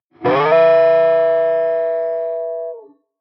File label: rock guitar